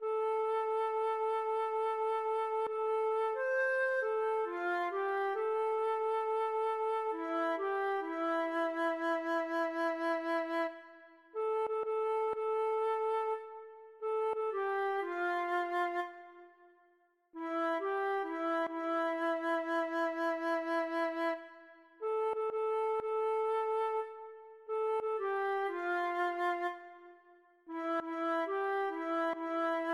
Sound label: wind, feadóg